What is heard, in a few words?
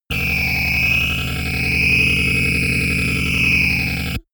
engine room